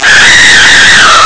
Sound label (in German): chor; ST-XX Amiga Soundtracker Sample Packs